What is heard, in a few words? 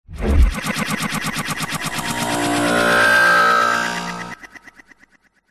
robotic power surge sci fi robotic robot cyber futuristic energy machine drone